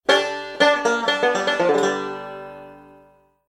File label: Banjo Notification